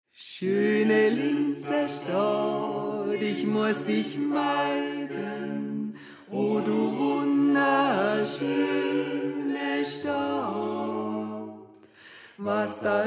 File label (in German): Tenor; Schöne Linzerstadt